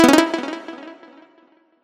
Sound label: combo; quatuor